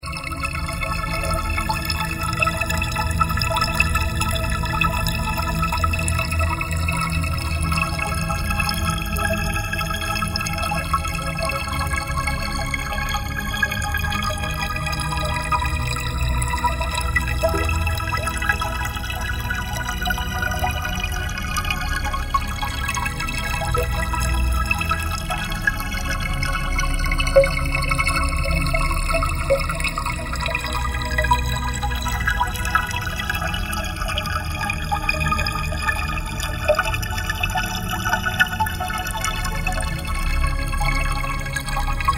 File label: babbling brook melodized; arboretum